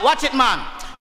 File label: VOCALS; Ueberschall; Jam Box